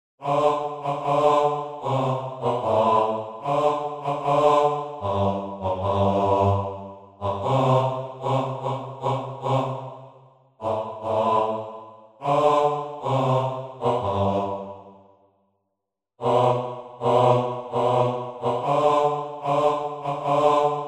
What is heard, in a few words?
Chorus Bass-Baritone Isolated SLOW; Heart of Oak